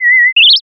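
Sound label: uguisu, Japanese bush warbler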